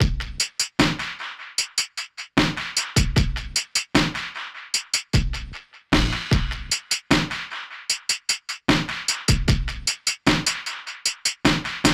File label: drums 70s soul silky 152bpm